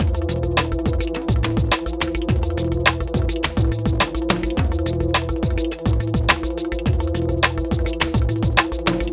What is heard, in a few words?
Darkwave, Processed Percussion